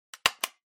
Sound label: Stapler Staple